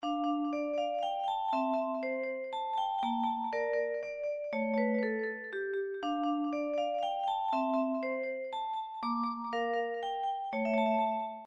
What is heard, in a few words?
game over; super nintendo donkey kong country 3